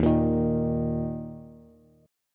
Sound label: Eb 5th, Bass; Eb5 strummed; JGuitar